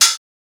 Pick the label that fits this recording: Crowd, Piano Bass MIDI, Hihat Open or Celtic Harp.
Hihat Open